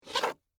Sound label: High Heels Thick, Footsteps, High, Heels, Thick, Heel, Scrape, Wood, Surface; BlastWave